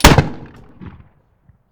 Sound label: Musket